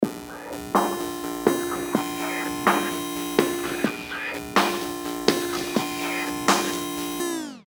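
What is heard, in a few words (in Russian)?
electro nazoylivaya melodiya, electro annoying melody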